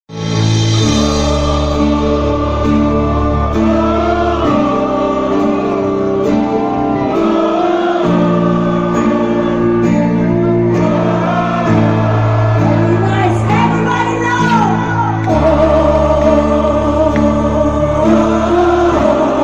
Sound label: Crowd Interaction